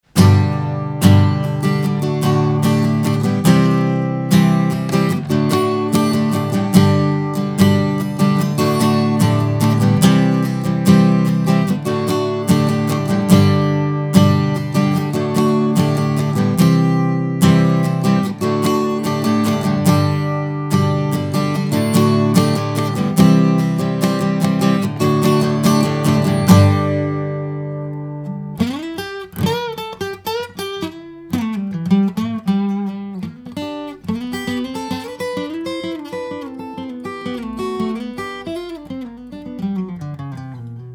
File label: strumming; mics